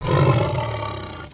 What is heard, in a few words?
Sber Tooth Come Here, creatures pets